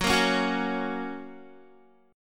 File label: F sharp Major F sharp Guitar; 14,13,11,11,13,x; strummed